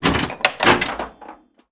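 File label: destruction pinball sounds; Explosions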